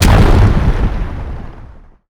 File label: rolling boulder target; weapons; dota; earth spirit